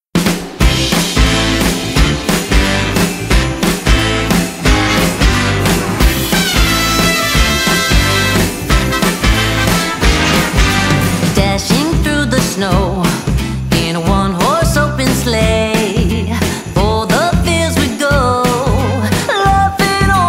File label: Jingle Bells